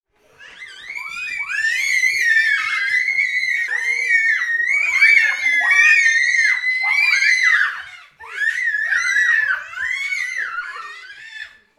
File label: Scared Children Screaming